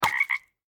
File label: frog hurt; Indev+ 0.3 Portable